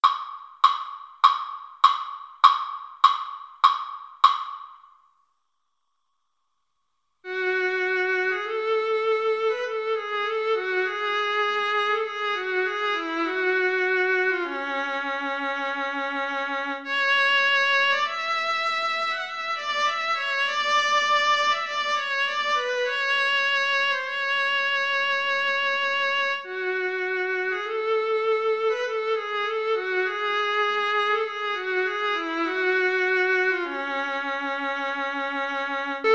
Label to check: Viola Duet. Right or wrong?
Right